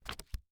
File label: Household Book Paperback Book Close